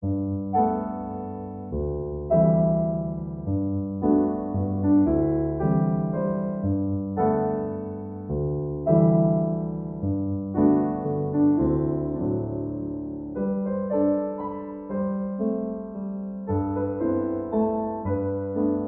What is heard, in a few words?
Solo Piano